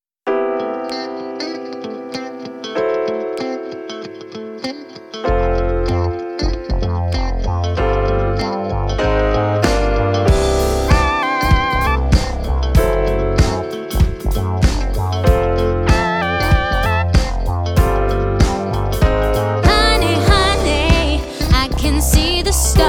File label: Rock Pop Band; Merseyside